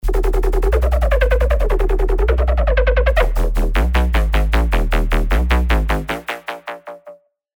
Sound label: Floor Eat Boots, Serum 2 hard bass; revealed serum 2 hard bass meltdown